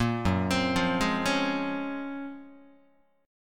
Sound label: F sharp Major Sharp 11th down Guitar, chord; arpeggiated; JGuitar